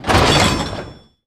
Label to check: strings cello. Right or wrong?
Wrong